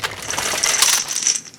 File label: Inf Weapons; Red Orchestra 41-45